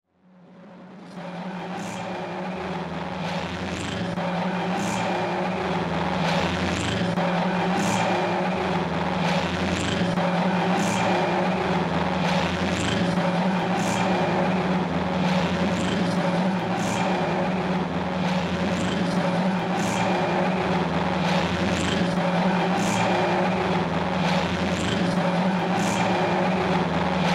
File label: Room Sound